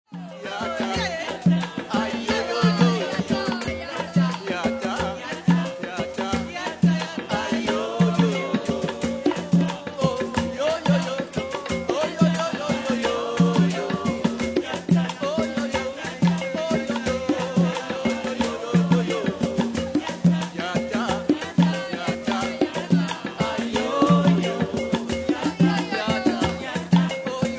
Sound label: rumba